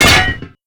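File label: metal hydrant impact; mtl hydrant impact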